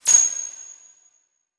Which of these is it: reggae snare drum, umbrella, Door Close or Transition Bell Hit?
Transition Bell Hit